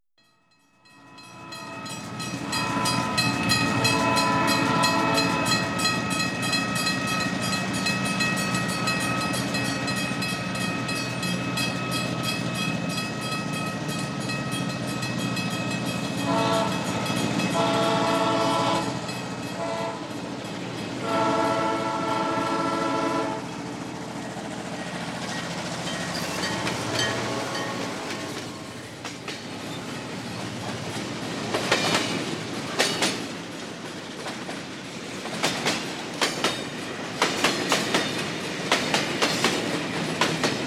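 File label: level crossing, train passing, honking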